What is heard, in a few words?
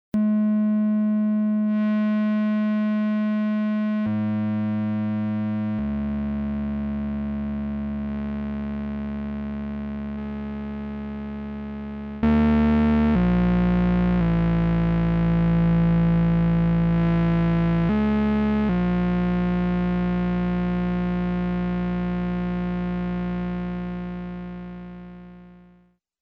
ONE VCO MIXED